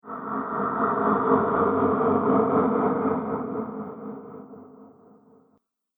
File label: Gruesome Ghost Monster Discharge; Sinister, Scary, Spooky Or Just Silly; Ghost Sound Effects